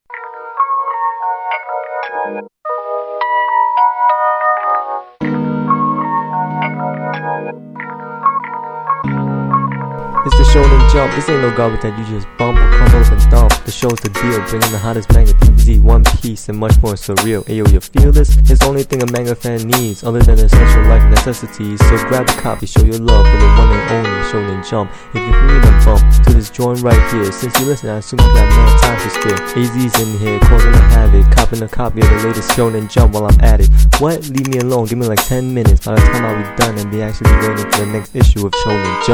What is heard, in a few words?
Beat